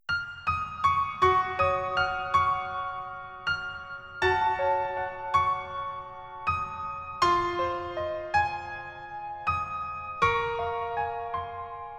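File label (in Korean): Piano version 멜로디MR -1키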